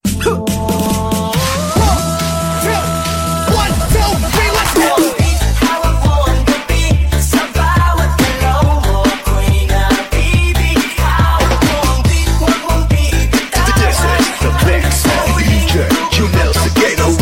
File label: beat